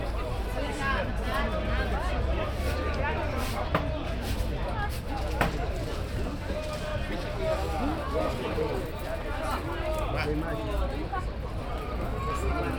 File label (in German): aufm Markt, Fieldrecordings Brasilien